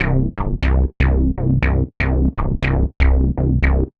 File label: Metallic Bass; Sonic Foundry (Sony Creative Software); The Electronic Music Manuscript Collection; Bass Foundations